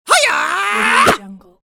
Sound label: cartoon karate yell whoosh hit